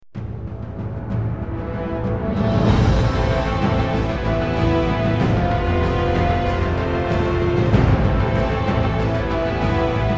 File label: Victory Good; command and conquer tiberium wars gamerip